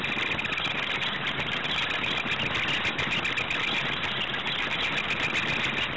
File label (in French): chauve souris